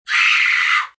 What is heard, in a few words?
Scream Kid Horror, Scream, child, horror, fear, terror (human voice); Screaming (Sound Effects:Voice)